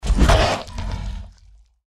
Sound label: deathclaw strike; npc